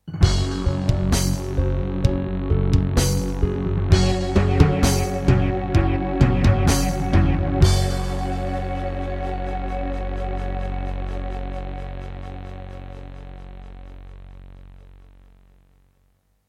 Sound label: Casio CTK-2100; sigil doom episode 5